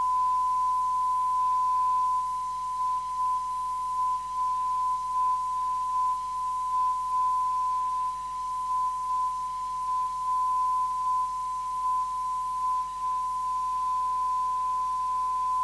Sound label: 318kHz; IRE-IRE